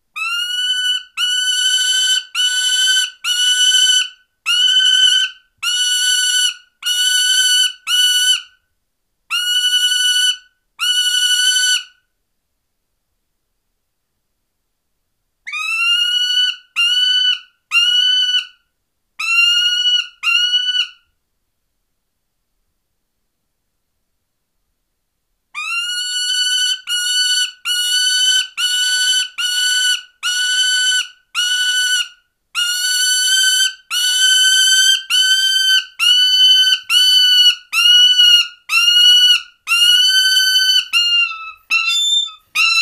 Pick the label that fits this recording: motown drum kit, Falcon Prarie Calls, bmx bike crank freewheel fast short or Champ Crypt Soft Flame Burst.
Falcon Prarie Calls